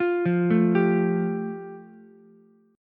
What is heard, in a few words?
F Major Add 9th, Ukulele C6; Fadd9 arpeggiated; JGuitar